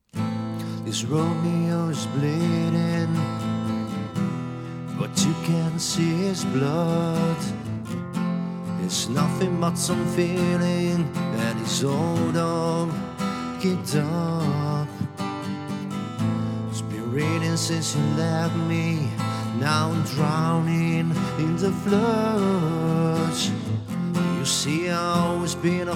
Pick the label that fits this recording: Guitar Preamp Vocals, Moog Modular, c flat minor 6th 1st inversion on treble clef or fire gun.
Guitar Preamp Vocals